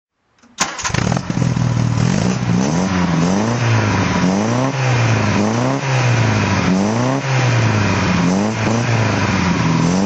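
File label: mga twincam throttle, engine sound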